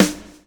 BLUE, BLUES; Spectrasonics - Backbeat

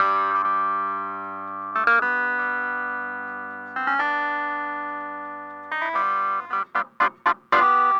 Clavinet, 70s Analog Funk; Sonic Foundry (Sony Creative Software); What it Is! 70s Analog Funk

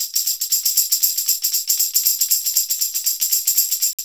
Tamb

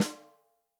rock snare chromatic, real drums

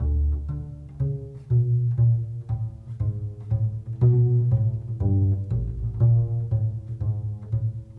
Bass Pizz, Orchestral; Sonic Foundry (Sony Creative Software); Orchestral VOL-2; Modern 01

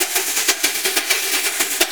Percussion Loops, Shaker & Tamb; Houseworx

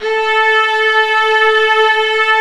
Vla A3; Digital Sound Factory Virtuoso

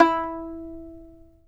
fiddle pizz E4 L; Sampleheads; Whole Lotta Country 1 & 2

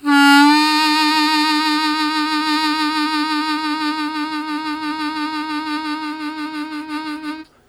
Strong Vib w Scoop Eb1, Harmonica; Zero G

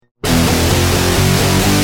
Guitar, Industrial

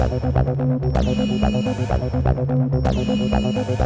ACID JAM; Best Service Advanced Media Trax Building Blocks

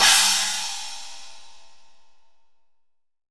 20 China, crash cymbal

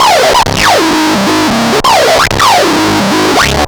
Analoop, Industrial

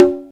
SP1200; SP-12+1200; Greytsounds VOL-1

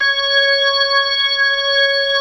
ROCK ORGAN

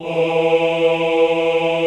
OHH E2, Classical Choir; Best Service; Extended Classical Choir

